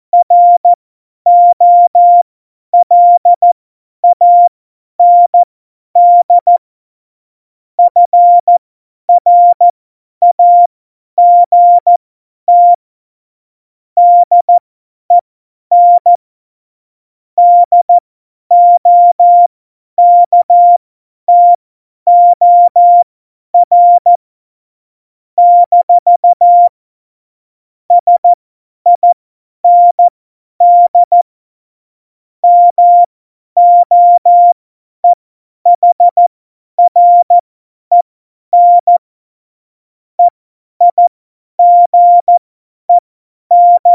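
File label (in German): Witz, cw morsen; Morsekurs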